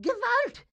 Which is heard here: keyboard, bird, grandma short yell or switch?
grandma short yell